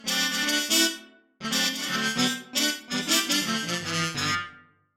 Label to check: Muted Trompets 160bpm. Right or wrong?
Right